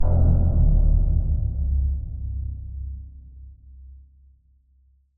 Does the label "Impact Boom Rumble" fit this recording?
Yes